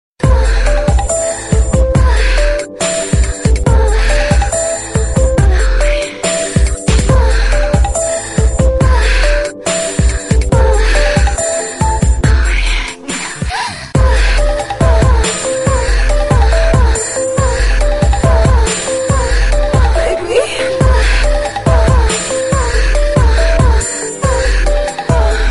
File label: erotic ringtone